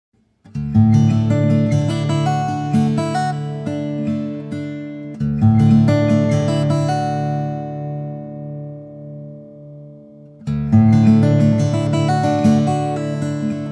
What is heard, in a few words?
Guitar; Vintage Prewar Guitars